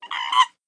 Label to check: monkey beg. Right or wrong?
Right